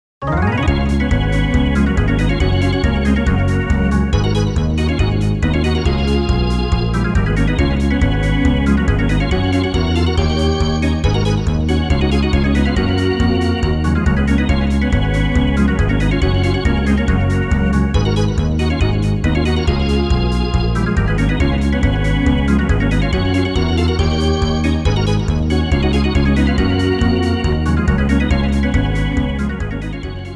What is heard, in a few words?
Game Ending Organ; Jikkyou Powerful Pro Yakyuu; Power Pro Music Hall 2